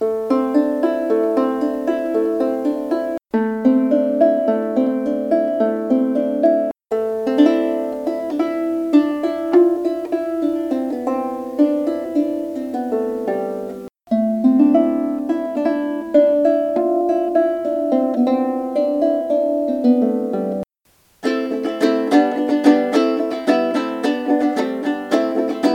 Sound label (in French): lyre chevalet chene vs ambre